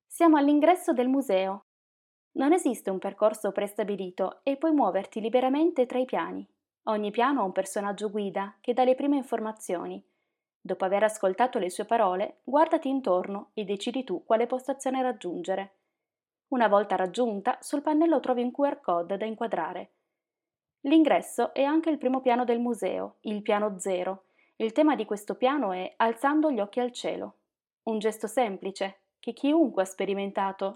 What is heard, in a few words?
Piano